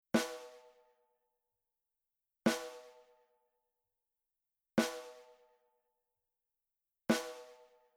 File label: SNARE Edge Soft